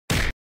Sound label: punch; family guy